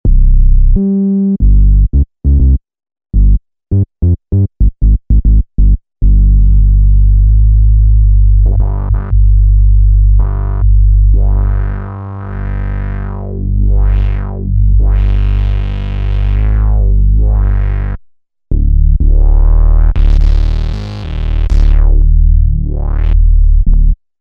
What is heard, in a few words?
Physical Bass, Roland V-Synth; Factory Patches